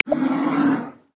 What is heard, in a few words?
Polar Bear, Polar Region; Xiaomi Redmi-Note-8-Pro